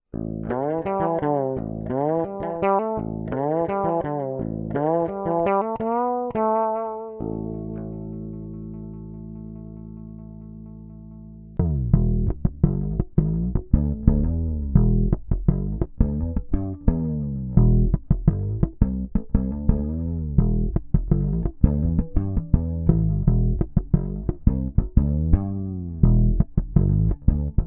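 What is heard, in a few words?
Tape Wound diverse EQs, bass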